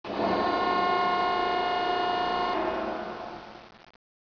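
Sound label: Hangar Ambience; XWing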